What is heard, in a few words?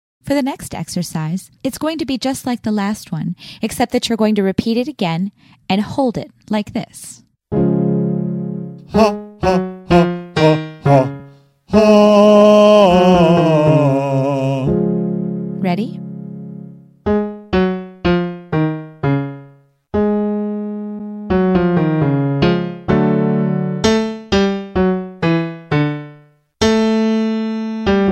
Tuck Hold male